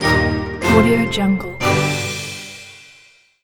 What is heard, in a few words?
medieval orchestral game win